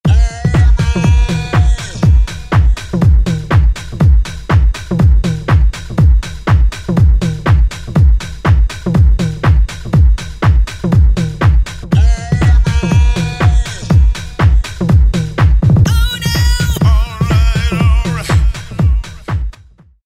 125, House Collection; Roland SR-JV80-19 House Collection

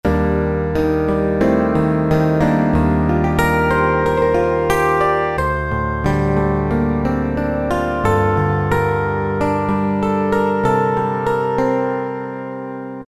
Xmod E-Piano; Roland PN-D50-04

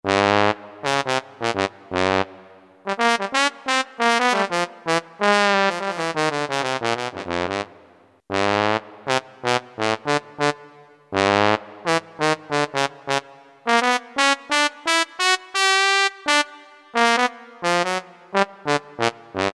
Tromborn, Roland U-220; Factory Patches

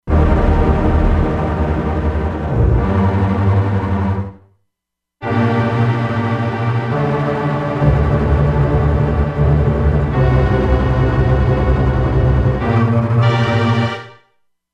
Trem Strings; Factory Presets; E-mu Proteus-2

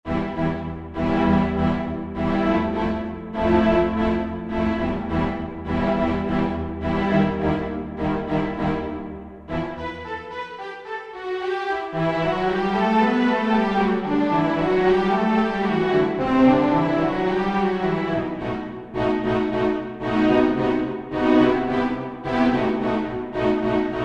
Str Down Bow, Roland SR-JV80-16 Orchestral II

